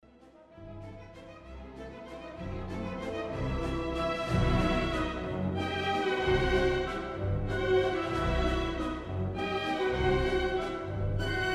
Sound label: waltz; Khachaturian